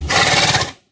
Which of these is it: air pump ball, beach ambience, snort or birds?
snort